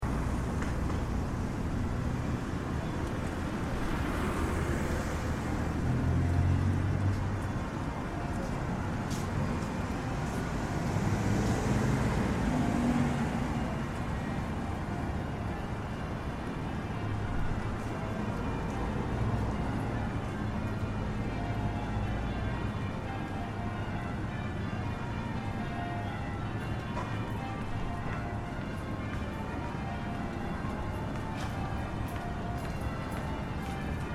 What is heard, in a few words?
Bells from Granville Richards moving east